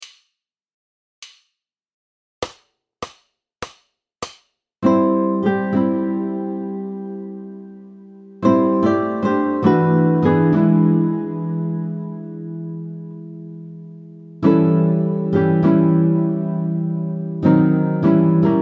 chord melody, guitare jazz

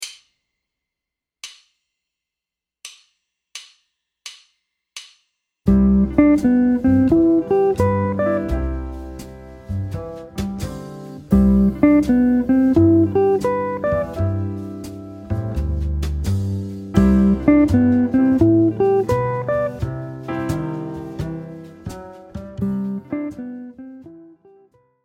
Maj phrase, guitare jazz; encapsulation diatonique supérieure et inférieure; motif jazz

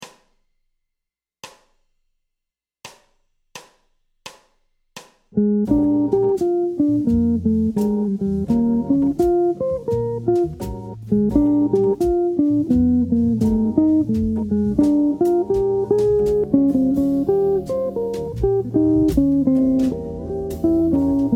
Blues lick; jazz blues

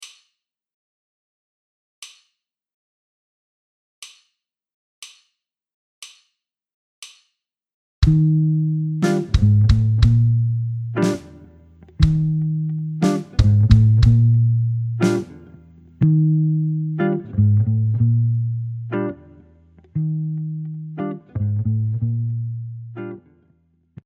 figure ballad, guitare jazz

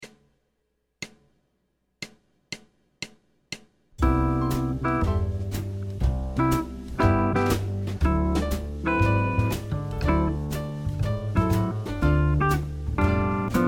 Comping 120 bpm; comping élaboré

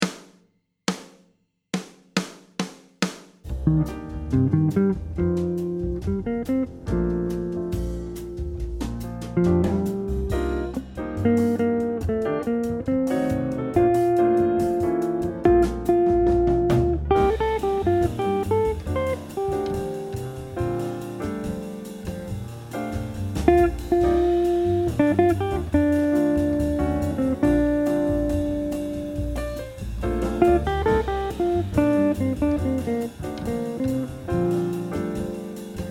chorus ligne penta en c dorien, guitare jazz

